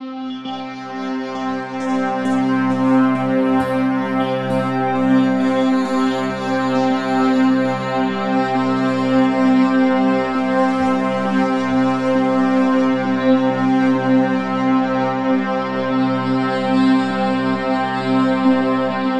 Atmosphere C; Ghosthack; Free Male Vocals and Atmospheres

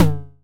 Perc, Deep House; Lover Groove; Ghosthack; Advent Calendar 2019

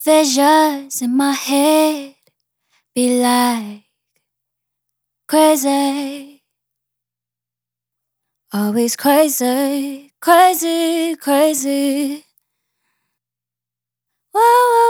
Vocal Phrase "Visions" - 135 BPM G# Min Dry; Vocals; Cymatics; ZODIAC Beta Pack

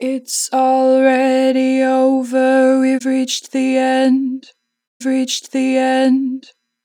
Natural Vibrato, Vocals, 4 bar vib; Harmor Vocals

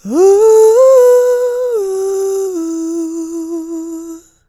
Ouuh, Vocals AdLib; Ghosthack

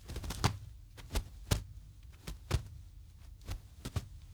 Eagle Wings Heavy Flapping; Fairlight Sound Lib

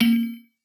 Vintage Percussion, Broken Triangle; Cymatics; Mystery Pack Vol 4

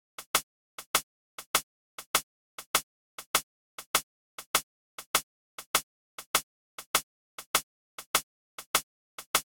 Drum Loop - 100 BPM Rim, Afrobeats; Loop Stems; Cymatics; PARADOX II - Drum Loop Collection; Duppy Drum Loop

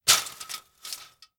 Blinds; Fairlight Sound Lib

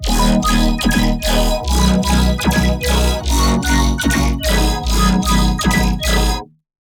Melodic Bass D# 150; One-Shots; Bass Hits (Melodic); Nucleus; Ghosthack; Color Bass Essentials

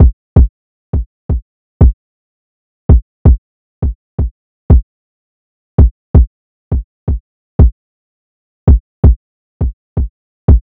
Manual Drum Loop 83 BPM Kick, Lofi; Cymatics; ANALOG EVOLUTION Beta

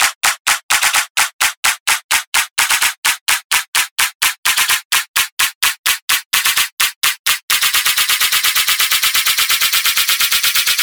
Drum Buildup, 128 BPM; Cymatics; House Starter Pack

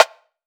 Rimshot Snare; Drum One Shots

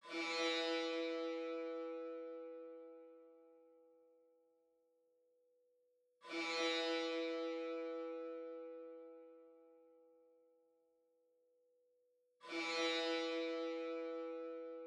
155 BPM E Min Texture, loop stems; Cymatics; 2022 Melody Collection Platinum Expansion - Pt 1; Dubai

